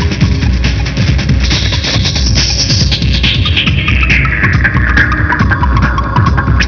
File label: popcorn